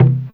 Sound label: Conga, Drums Fairlight IIx